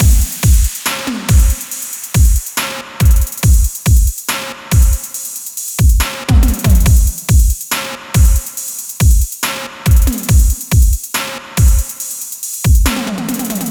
Crawler Drums, Trap; трэп

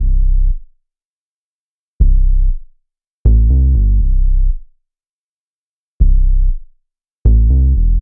Dub Sub; Сэмплы баса (Electro Dub); 120 BPM; Bass; loop; электро

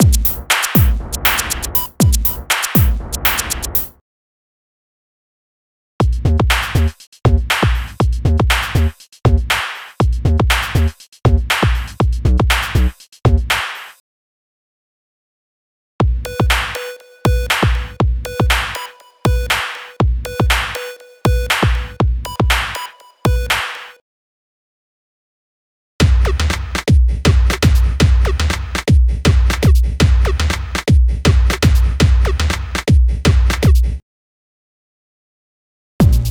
Club Dance Beat 120 BPM; Ударные (Drum Loops)

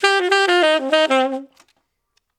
funk solo H, духовые

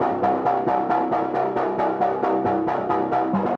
Rolling Tubes; Techno (Техно)

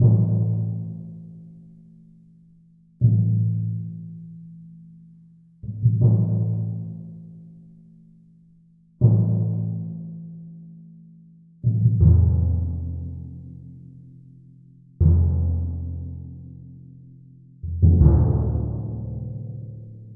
timpani

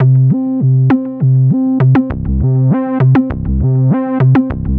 Pop bass

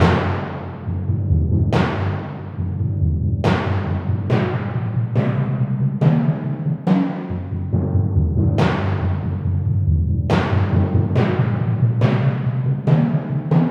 timpani; звук для напряженной сцены; литавры

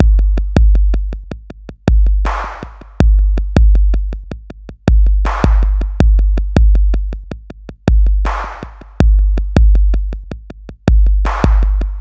Electronics; Ударные и барабаны (Эмбиент); Beat; Drums; loop; Ambient; 80BPM; ambient vol. 3

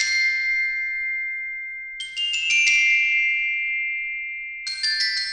Sweeping Bells; молоточки

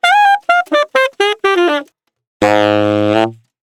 Sax Solo; Disco House

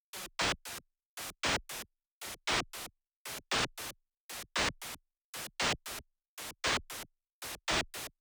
Noisy Set, electro pop

